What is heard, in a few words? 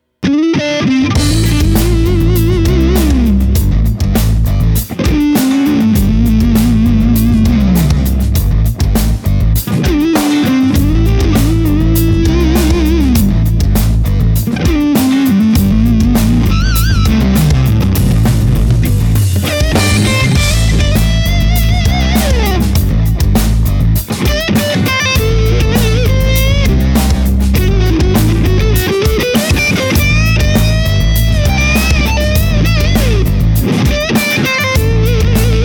with drums; Helios